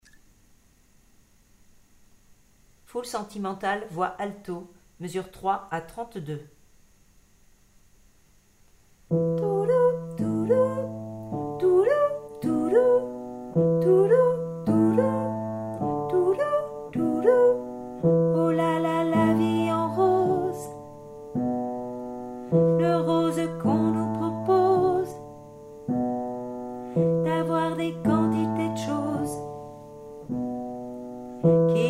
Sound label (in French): alto